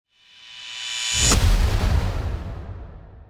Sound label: last Card Turn